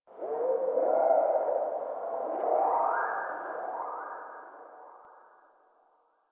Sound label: Covered Vine Area Low Chirp; Ambience; Misc Outdoor; Warframe; Infested Eris